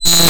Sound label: Buzz Incorrect Reverse